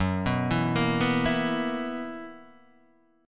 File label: B Major 7th Suspended 4th Gb